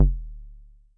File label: bass stabs; FutureRetro FR777